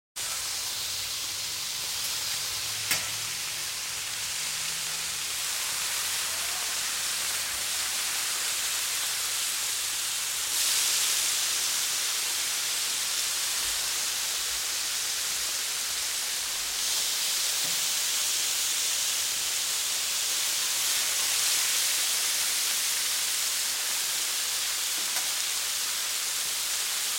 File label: Hamburgers Fry on Grill w Spatula Hits; Household